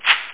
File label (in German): RASSEL, DRM PERC